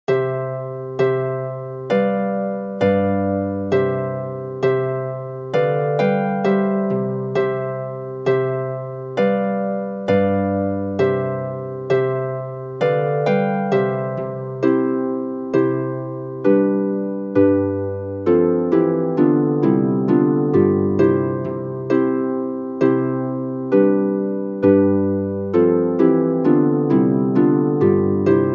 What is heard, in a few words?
jig for flute oboe harp; the irish washerwoman